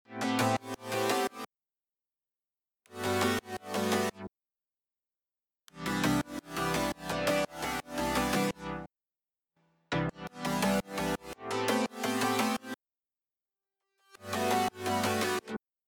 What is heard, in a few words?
chord